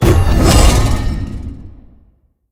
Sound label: activate; Smite; Tiamat